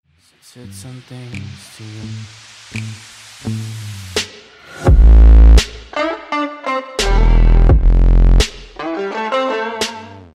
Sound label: twitch hitbox follow donation sound